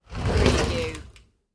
sliding door

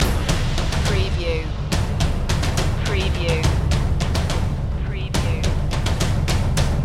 megadrums loop

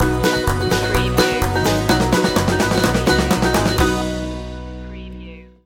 cowboy hat stinger

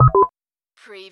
Servo Panel Sound - Keypad

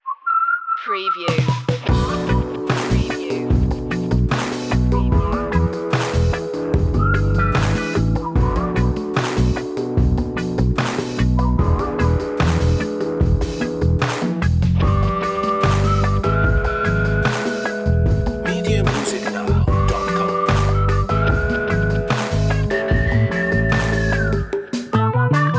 whistle lead

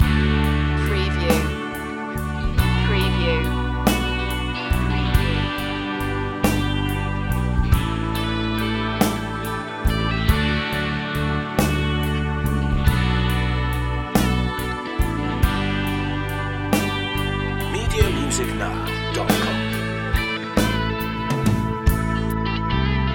blues loop

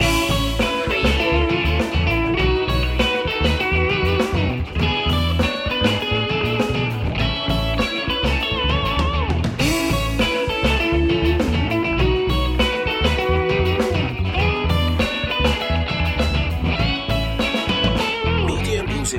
loop

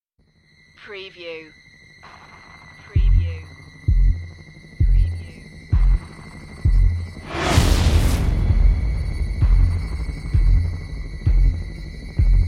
horror trailer no big hits

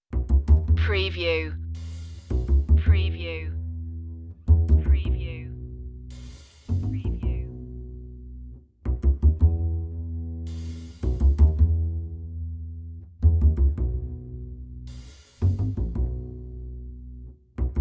perc and bass only

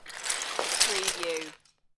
Lego, grab several lego pieces

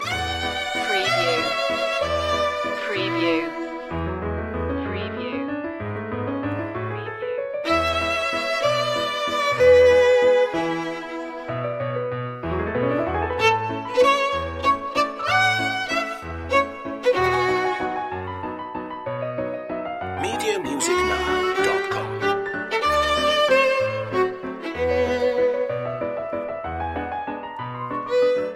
waltz loop

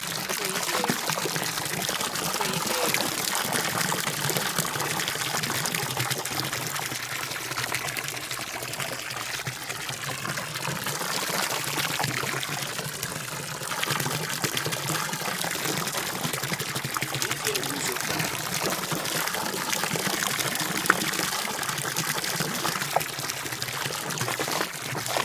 Water Hose Fill Pool; Sound Effects Bible

